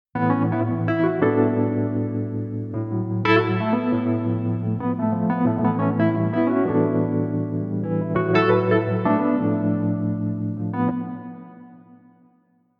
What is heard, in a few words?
plate wet